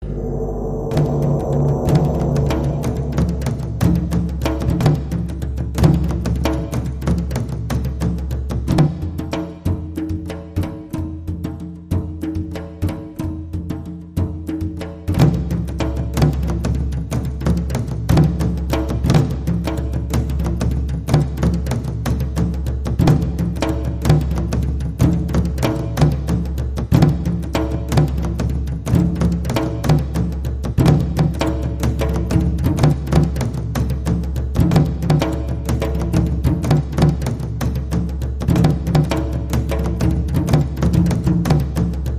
Taiko Thunder

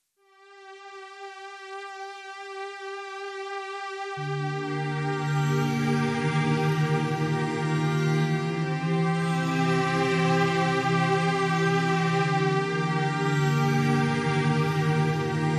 Synth Stem

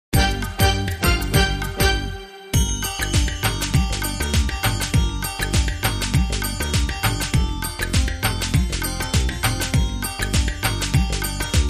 Bhangra Beat Underscore

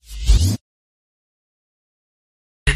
Quick Rounder

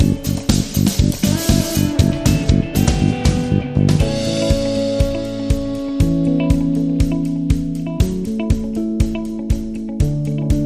Soaring Indie Pop Rock

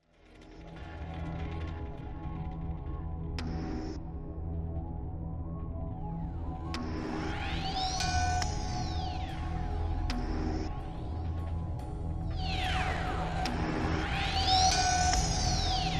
Slow Drum Psycho

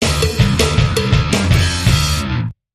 Bass Rocker Sting

